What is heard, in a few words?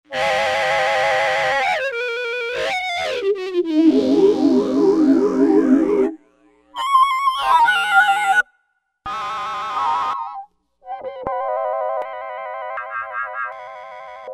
Filter Bank2, Roland V-Synth; Factory Patches